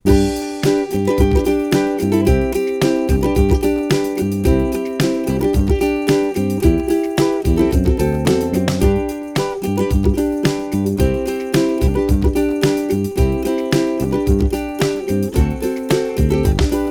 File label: Happy Uke Fun, no melody